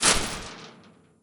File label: chainlink, footsteps